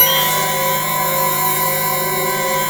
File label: B4 METAL; Northstar; The Wizard VOL-1